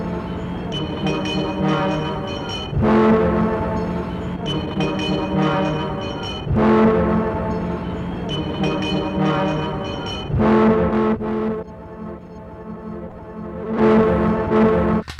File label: horn loop